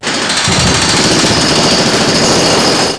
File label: construction